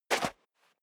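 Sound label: step dress boots sand single left, footsteps; schritte; menschen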